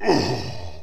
death

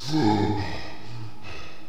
gasp

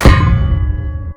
grapple hit

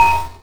hit hi

